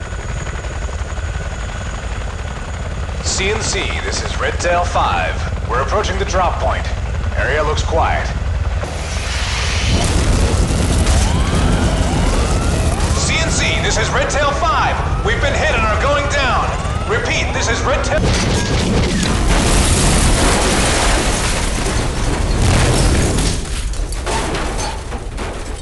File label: chopper crash; Frozen Terror